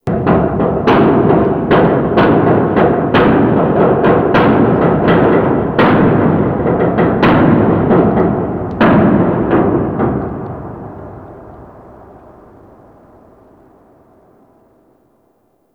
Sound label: Ice Metal Dist; Warframe; Corpus Ice Planet